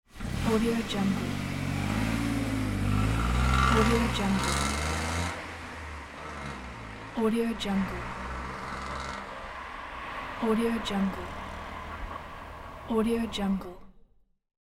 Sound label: car leaving